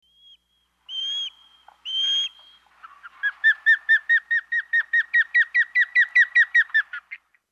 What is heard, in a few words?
osprey